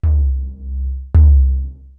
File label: FAT TOM; Back In Time Records; Big Bang